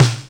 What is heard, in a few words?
DRM F, LINN 9000; Best Service ProSamples vol.55 - Retro Sampler; AKAI